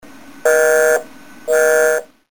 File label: busy